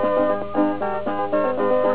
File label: casio